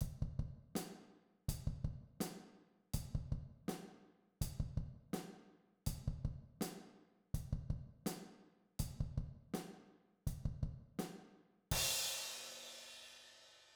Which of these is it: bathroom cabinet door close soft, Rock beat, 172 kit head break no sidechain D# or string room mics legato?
Rock beat